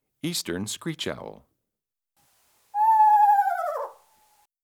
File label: screech owl; Peterson Field Guide to Bird Songs (Eastern/Central US)